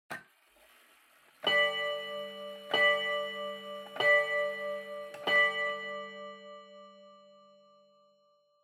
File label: Pendulum Clock Chime Long